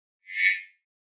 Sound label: Mouse Over Elect